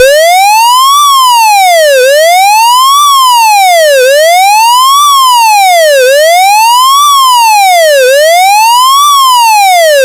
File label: Sweeping Germany, IMO 3d, Germany KTA3901 (evacuation Alarm); pfannenberg; signaling-technology; combined-signaling